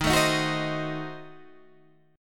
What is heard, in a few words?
D sharp Diminished 7th D sharp; D#dim7 strummed; 11,12,13,11,13,11